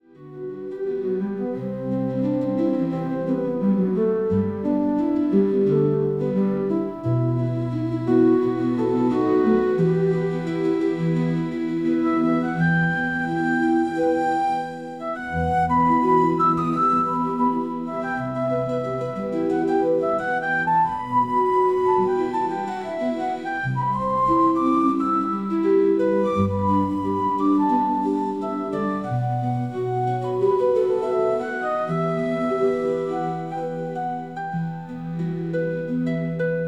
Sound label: altered pulse code